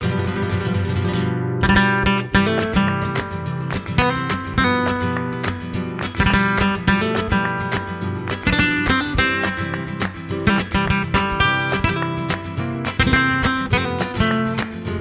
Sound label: Flamenco Happy Birthday